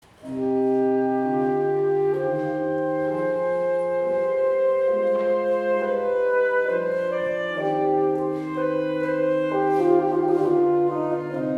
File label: Organ and Saxaphone Adagio